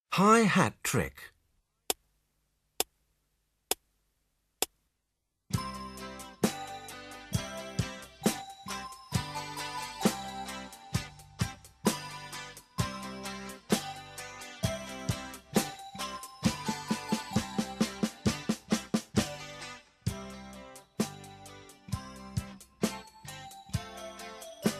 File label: hi hat trick